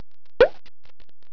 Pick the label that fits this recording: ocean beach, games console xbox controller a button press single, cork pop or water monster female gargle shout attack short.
cork pop